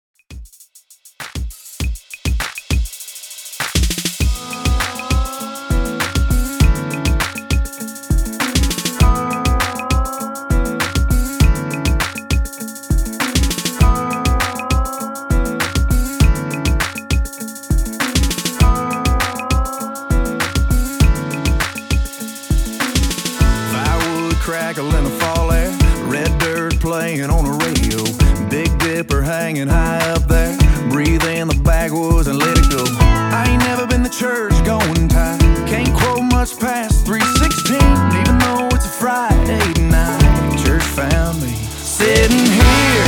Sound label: COUNTRY REDRUM 100